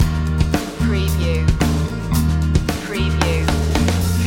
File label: loop no piano